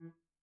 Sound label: Grand Piano, Kawai, rel E2; Chordophones; Releases